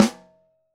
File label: SN1 Top, Snare; Ableton Foundation; Snare Designer